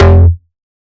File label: Bass F, Bass Synth